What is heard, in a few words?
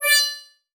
Multimedia Internet CD-Rom Flash Buttons Short